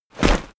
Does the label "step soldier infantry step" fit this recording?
Yes